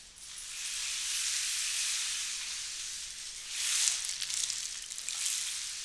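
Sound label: RAINSTICKS; Spectrasonics; Heart of Africa 1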